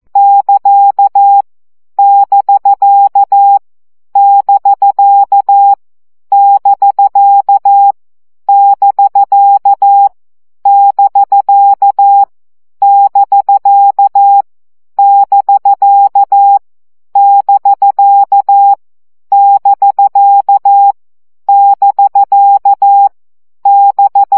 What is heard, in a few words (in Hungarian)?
CW gyakorlas bk 12 baud